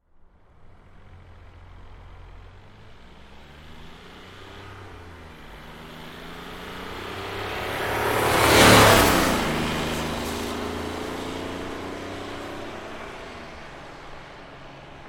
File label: Car Smart Car By Fast Accelerates; 2005 Smartcar Fortwo; Vehicles